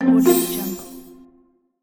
gameplay soft award

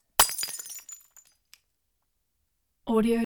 beer mug smash

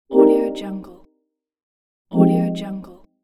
chat message notification; sviridpavlov

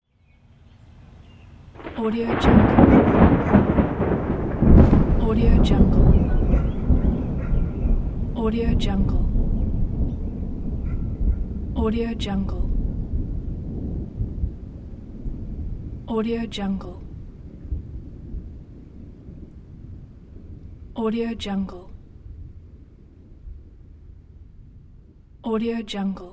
thunders

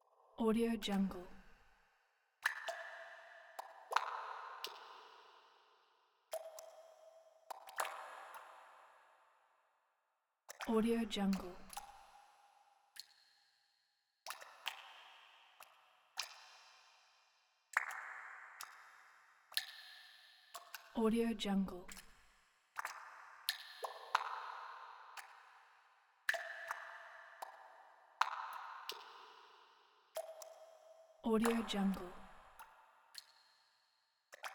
sewer

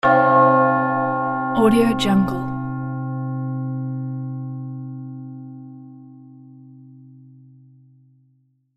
big bell

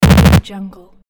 8bit transmitter

